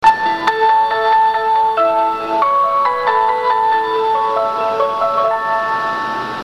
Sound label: bus chime; 京成バスシステム